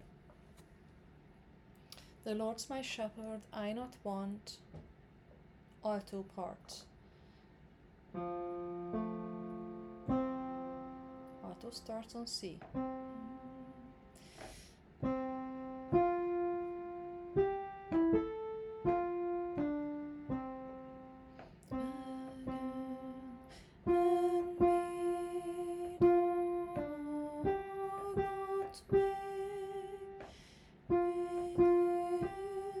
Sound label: ALTO